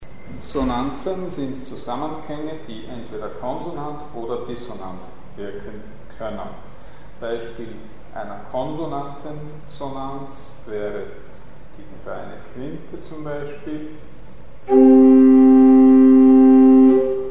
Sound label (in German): Sonanzen; Mikrobuch